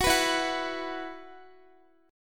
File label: E Suspended 2nd, Guitar; Esus2; strummed; x,x,16,16,16,13